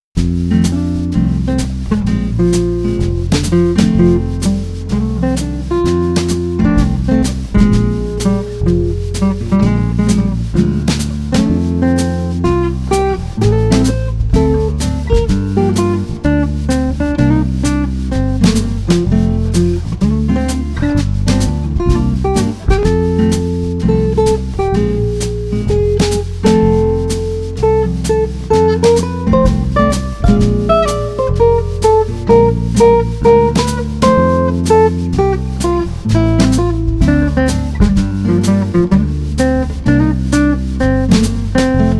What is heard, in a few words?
Jazz Bar